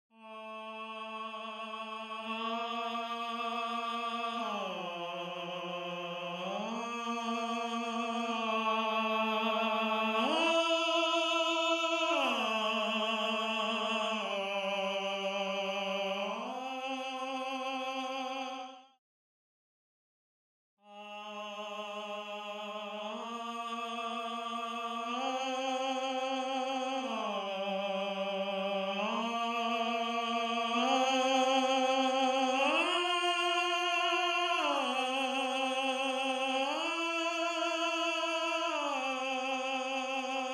Tenor, counterpoint

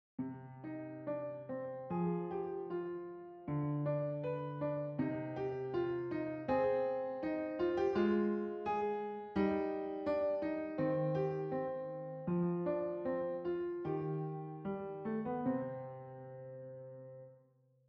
Piano

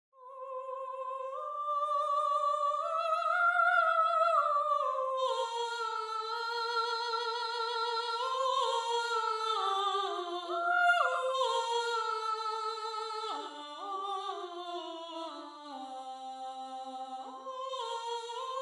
Soprano

